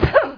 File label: land; Daikatana; mikiko